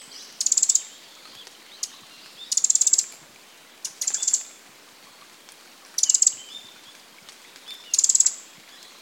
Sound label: Troglodytes troglodytes; SETE